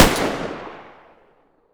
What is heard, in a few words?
sks distance fire